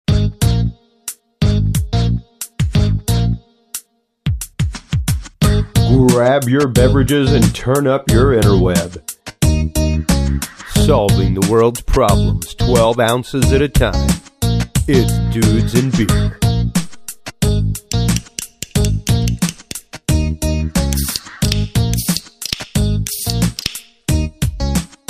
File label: DnB intro